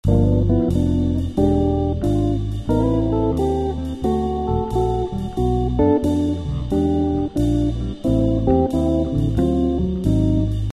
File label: Jazz